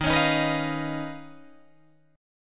D sharp 7th Suspended 2nd Sharp 5th Guitar Drop D

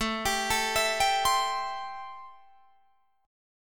A-Minor 7th A Guitar Drop D

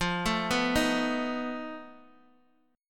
F 7th Flat 5th F down Guitar Open G

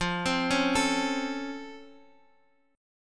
C sharp Augmented Major 7th F, guitar chord

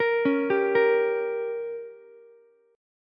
G Diminished, Ukulele; arpeggiated; JGuitar

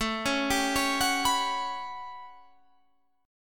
A 13th, Guitar Standard, chord; arpeggiated

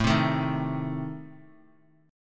A Diminished, down Guitar; Adim strummed; JGuitar

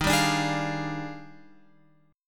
D sharp Major 11th, guitar chord

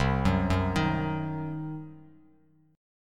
C Diminished C, Bass Standard; arpeggiated; JGuitar

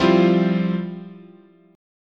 F Major 7th Suspended 2nd, chord

